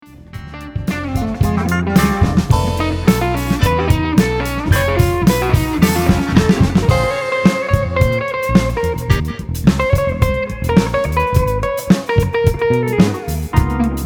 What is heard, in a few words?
Solo